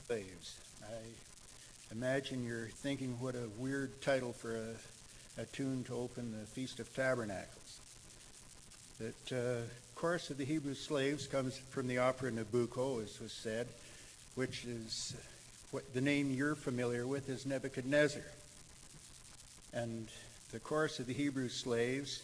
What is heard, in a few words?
Festival Choir Chorus of the Hebrew Slaves